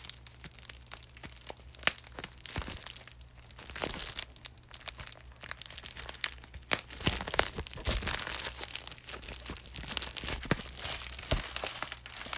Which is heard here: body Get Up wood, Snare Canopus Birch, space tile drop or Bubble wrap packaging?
Bubble wrap packaging